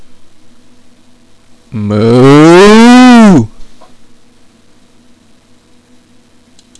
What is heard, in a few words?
moo